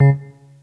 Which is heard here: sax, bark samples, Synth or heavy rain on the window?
Synth